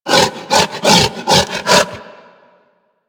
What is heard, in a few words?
Feral Vocal Breath Run; Warframe; Kubrow